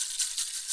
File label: RAINSTICK; AKAI S6000; WorldSounds